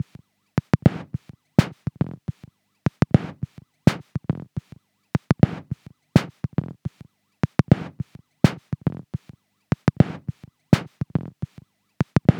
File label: Pulse Beat